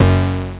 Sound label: Tough Bass; Big Fish Audio; Old Skool House; OSH Bass